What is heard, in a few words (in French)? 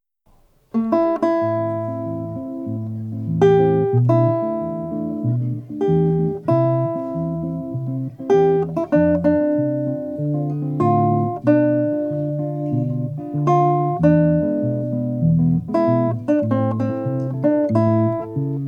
Samba Cubana